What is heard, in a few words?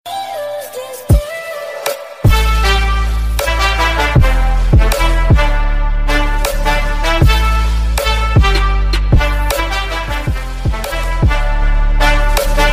Arabic trap